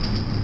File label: Cage Door Loop; Fire Temple; Zelda; OOT